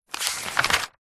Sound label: household book wordsearch magazine page turn fast hard; zapsplat